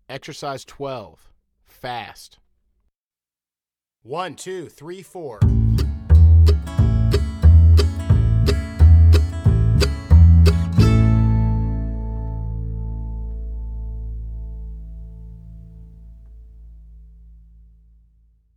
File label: Exercise Fast